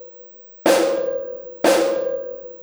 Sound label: Snare Particle, Processed Drumkits; Zero Gravity Beats; Particles